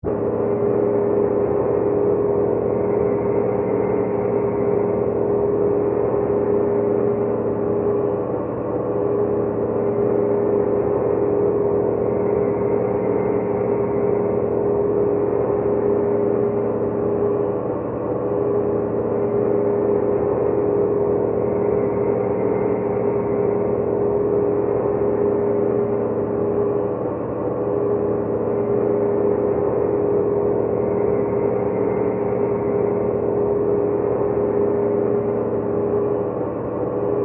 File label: Ambiance Tonal, drones & tones